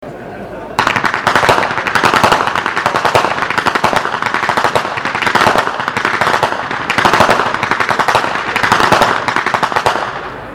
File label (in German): schnalzen; offenwang